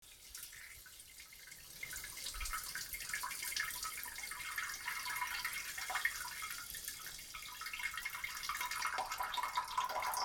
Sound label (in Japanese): ぱちゃちゃしょにおしっこ